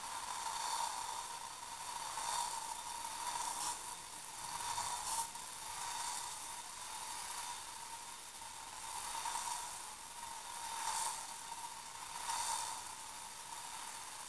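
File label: HAIR SPRAY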